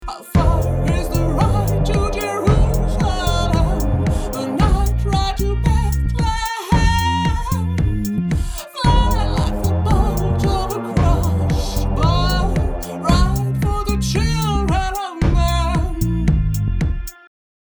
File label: Gospel Ringtone